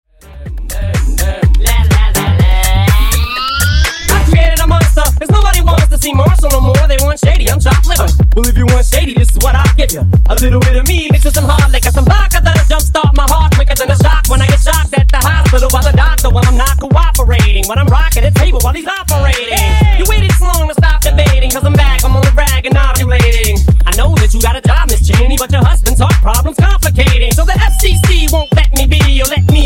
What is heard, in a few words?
Funk Vocal Edit Clean 124Bpm